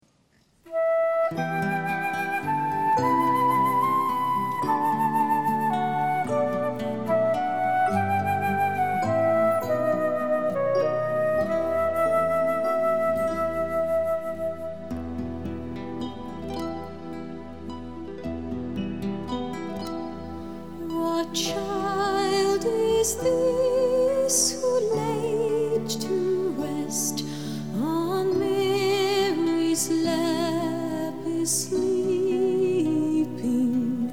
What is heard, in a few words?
What Child Is This (Greensleeves), Gospel Choir